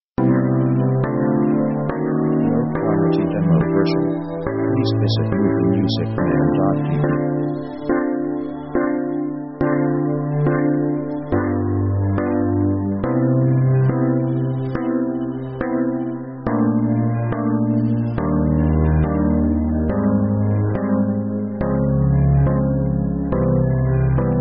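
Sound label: synth orchestra 70s marching sketch; contemplative themes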